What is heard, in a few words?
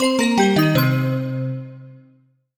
Bell Voice Negative; MUSIC EFFECT; startide